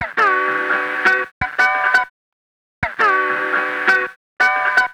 Guitars; Sonic Foundry (Sony Creative Software); Stylus Pressure Urban Grooves on Digital Wax; Wickey Wah

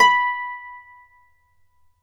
Celtic Harp, Plucked Strings

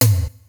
DR-660 FX; Rubber Chicken Software Sample Bank

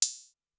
Cym; Sonic-Potions LXR; Brezel

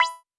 TR808 Cowbell, Roland SH-32 Drumset

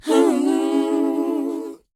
WHOA B, Vocal, R&B & Dance; ILIO; Vocal Planet

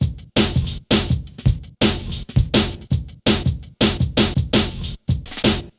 Hard Stepz, Jungle, Drum Loops; Zero-G Jungle Warfare 1, 2 & 3; 90 s Sample CDs

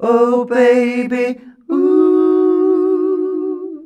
OH BABY UH, males; Best Service; Hyper Dance

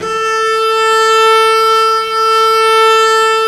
STR VC Arco Solo p

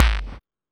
Techno F; EMU XL-7

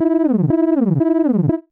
ALARM

